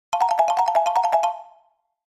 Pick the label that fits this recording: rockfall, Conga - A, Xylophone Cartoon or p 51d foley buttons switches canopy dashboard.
Xylophone Cartoon